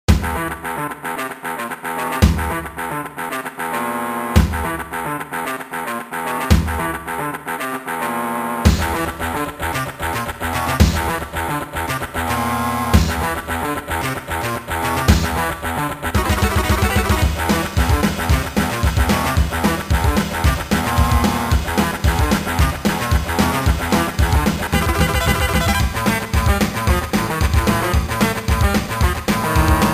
Midi; E1M1 DooM

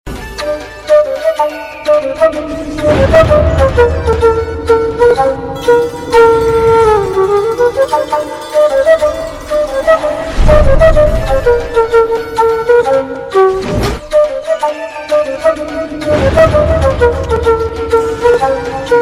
Flute

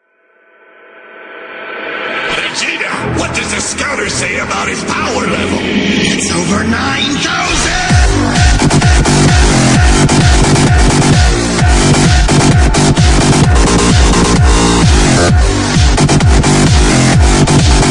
Dubstep

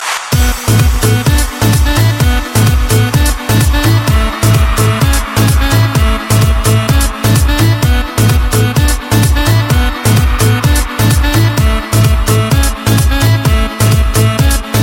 Electro Sound

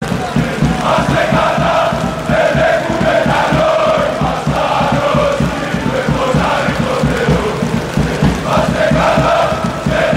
Chant

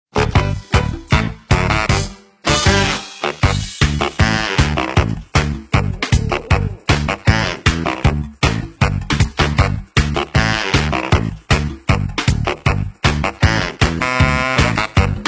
Funky Trombone

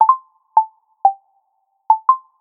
Startup

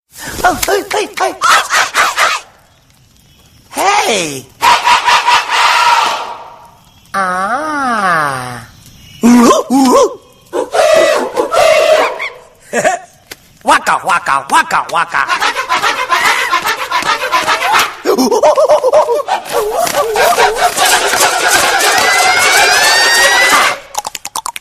Car

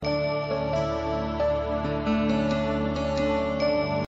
Melody Tone

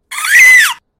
Fiddle Screams

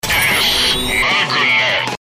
Magnet Insert

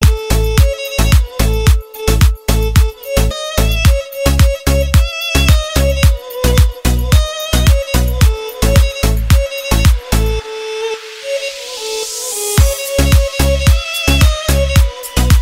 arabic remix

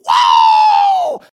Wooooooo; Ascot Man

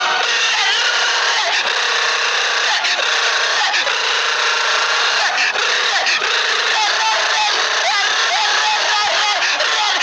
Gatling gun; Tyler1

